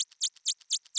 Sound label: sine; gillette